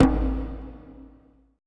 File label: TOTEM, ETH DRUMS